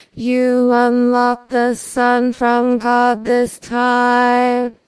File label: singing